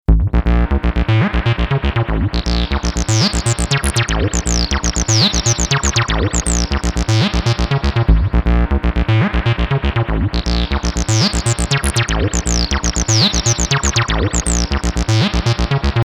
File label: Spring